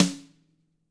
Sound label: RIM, TAMA SNRD, Drums; ILIO; Double Platinum Drums 2